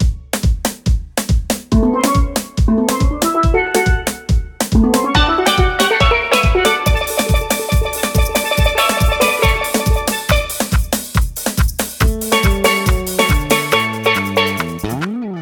Pirate Town Intro Loop

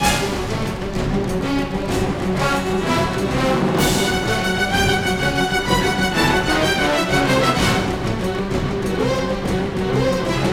Combat; The Hobbit; PS2

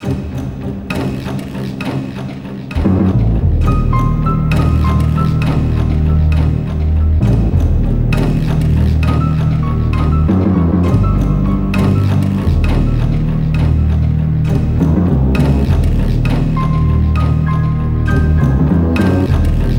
gameover; Spawn Armageddon; Spawn Unmei no Kusari; PS2

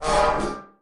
Parade March; lethal company

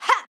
Swipe; Jackie

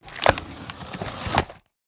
Object Crate Opening Start Up

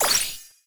Enemy Info Tap

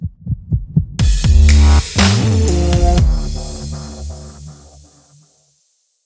Stinger Kiss (Hard); Inescapable No Rules No Rescue

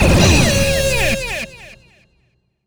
Perfect Full Combo

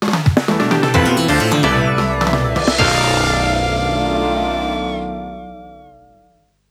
You Lose; Deathwish Enforcers; PS4, PS5, Switch

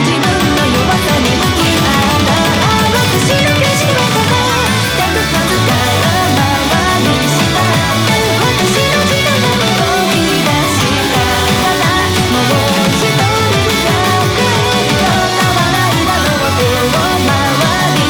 Bgm Reach

